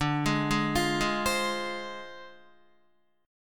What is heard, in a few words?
D Minor 7th Flat 5th Guitar; Listen to Dm7b5 arpeggiated; JGuitar